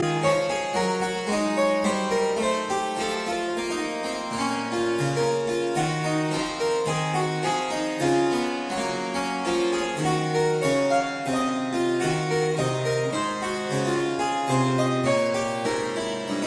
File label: French Suite No 4 in E flat major BWV 815 I Allemande